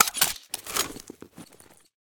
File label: Quad Open